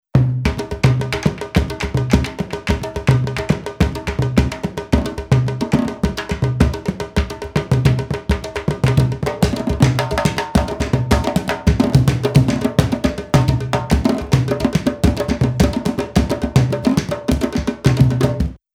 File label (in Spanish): tambores